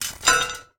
Death Drop; portal quest android

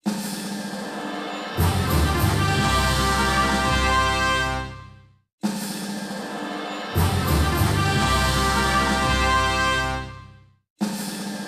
Intro Fanfare; Ice Age: Continental Drift Arctic Games

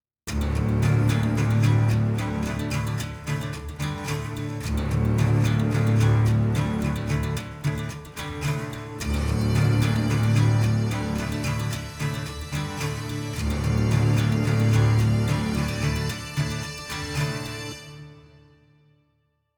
Monster Hunting Combat; Skellige

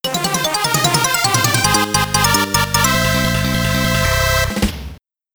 Bonus Game Completed!; Soccer Kid; Amiga

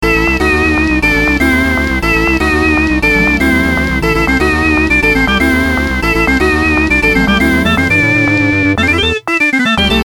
Hurry Up (Halloween); Gator Panic

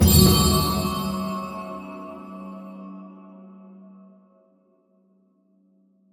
Rune; The Dwarves 2016 ps4 gamerip

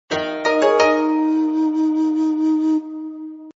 Full Belly Achieved Music

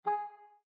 Landscape Information Bar, windows 7

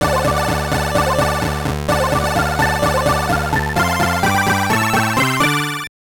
Jingle; Nations: The Land of Legends; gbc

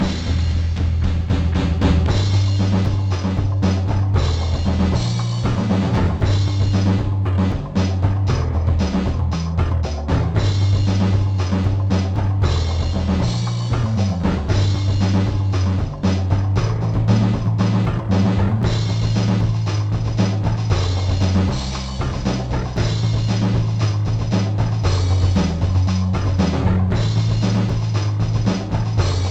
BGM Combat No Gtr; X-Men Origins Wolverine; NDS

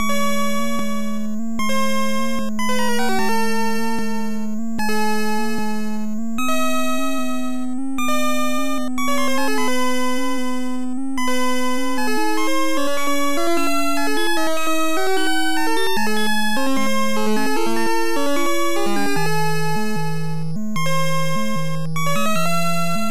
Cave BGM; Ghosts n Goblins; NES; 3, 4 Stage

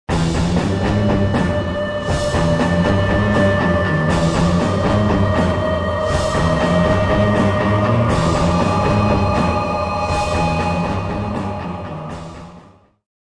Modern Agitated; Civilization Revolution

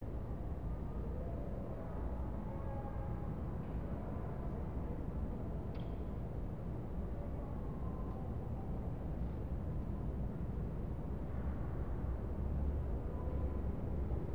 Nepal amb

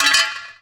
Lamp Collision; angry birds rio

